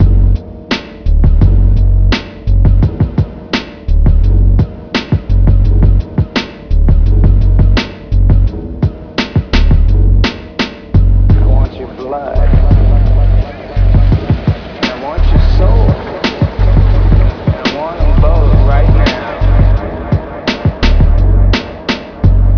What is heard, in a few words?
soul grimey loop lofi